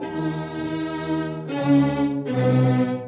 strings three note, Sad Loops

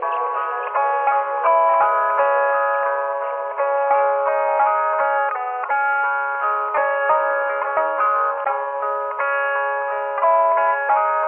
guitar, Jazzmatazz Loops

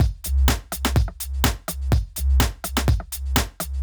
Drum loops, Electro

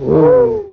"woo", male

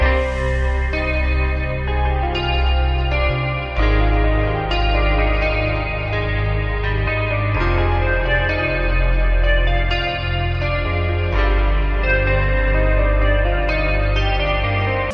very metal, Loops Electronic

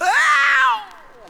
scream ungendered; ooh ahh

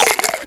eating

